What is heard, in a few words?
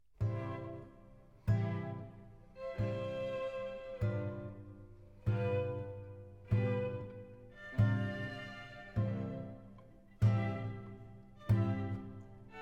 Quintet C major Variazioni; Quintet No9